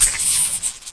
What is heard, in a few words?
shock die, shockroach; svencoop